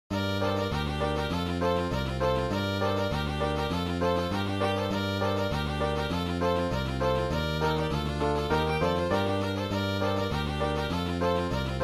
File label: tarbukas; tommys